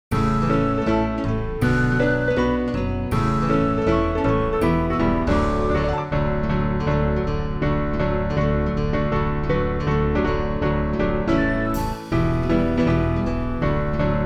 God Made You backing; New Hope Music

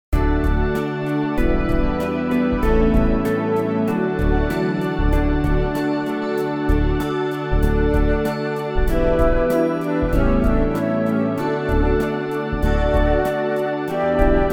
Doxology Round (instrumental); New Hope Music

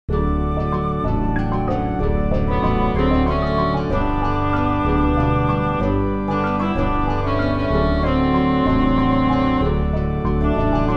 instrumental; New Hope Music

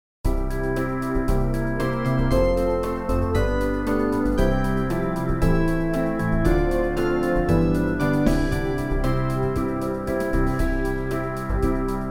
One Thing backing; New Hope Music

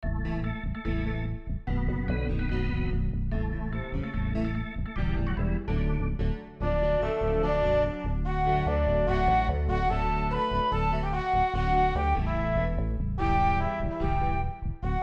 instrumental; New Hope Music

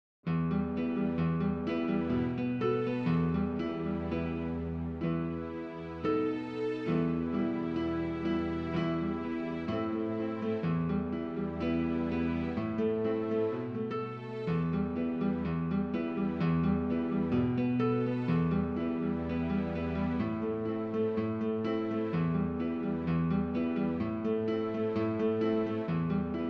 backing; New Hope Music

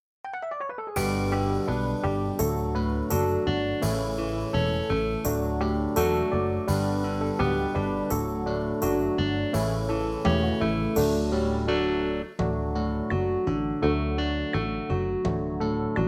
backing; New Hope Music

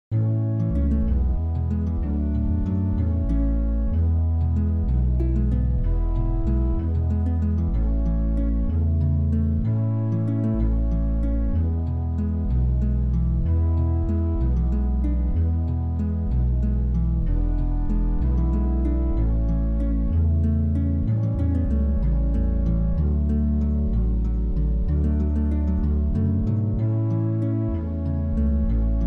backing; New Hope Music

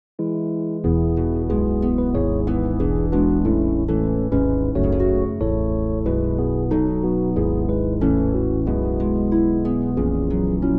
instrumental; New Hope Music

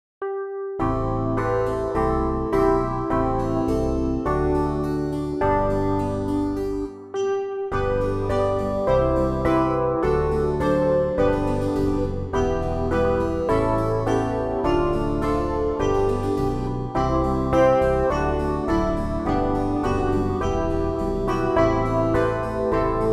instrumental; New Hope Music

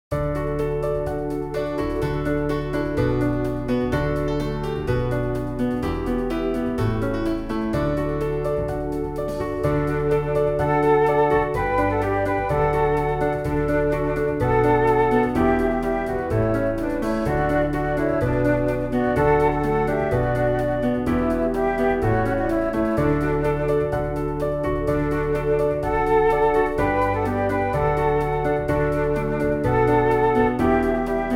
instrumental; New Hope Music

